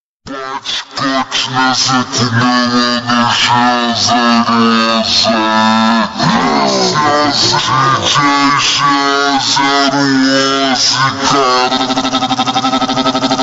ultra slowed reverb

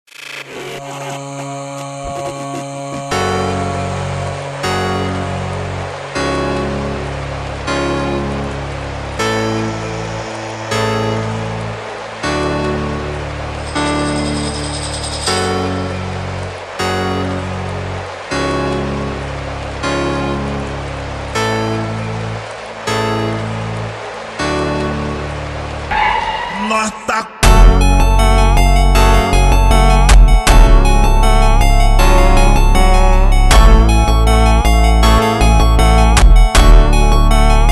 ultra slowed reverb

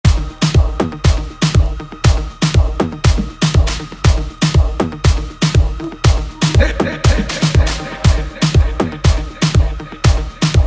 dhol dj song

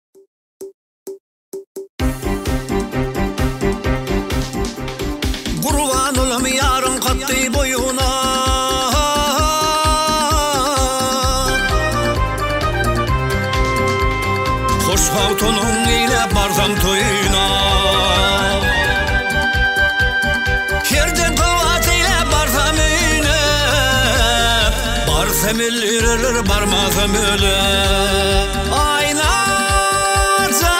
turkmen aydymlary relaxing and cheerful songs

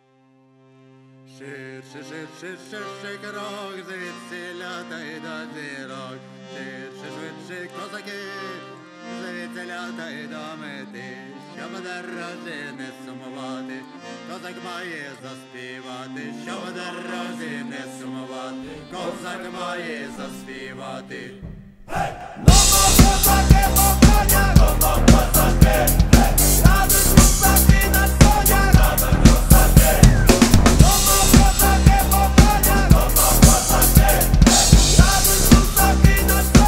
drum cover

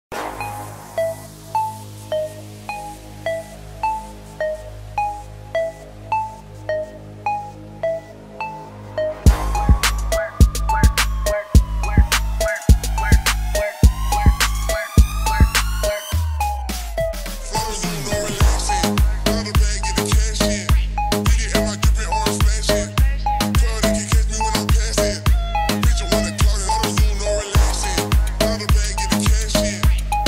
club bass remix

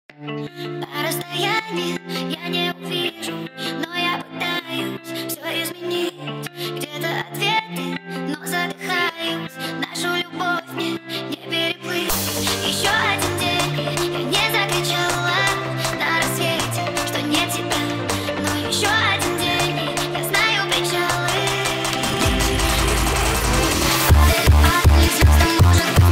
hardstyle remix

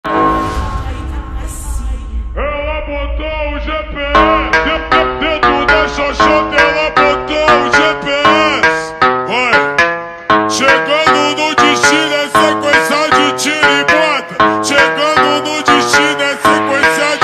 brazilian funk slowed

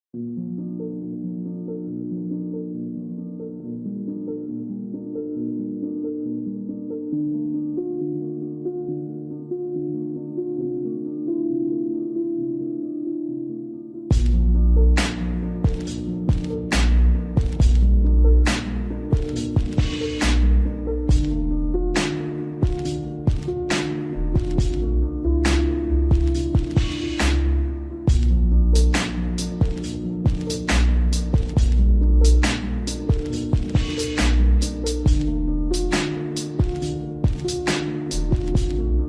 slowed reverb

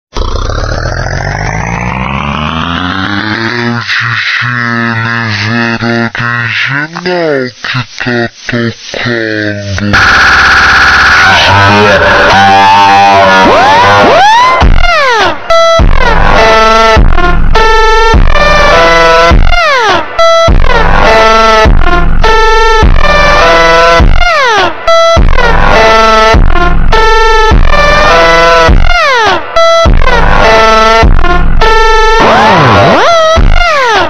mega slowed

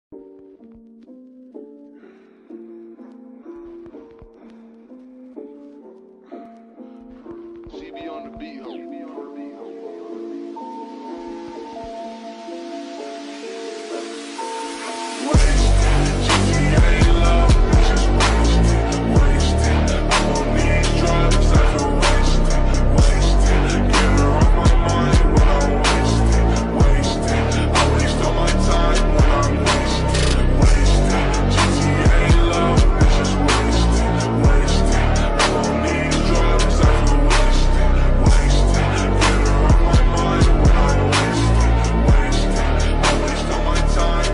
very slowed and reverb; tiktok remix

